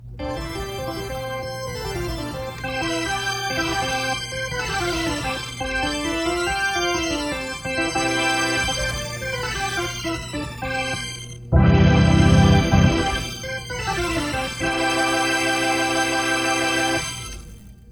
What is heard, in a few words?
organ; preset Highs